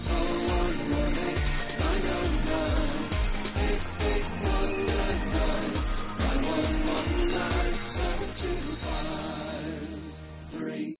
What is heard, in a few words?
ringer; snom3xx; Ringtones